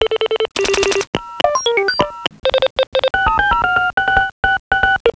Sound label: PHONE, 电话; 设备音效; 办公设备; 特酷声音素材库